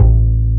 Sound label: W BASS A1